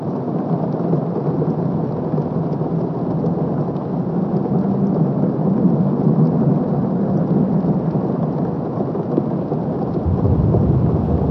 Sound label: AMB rain city indoor; ゆめ2っき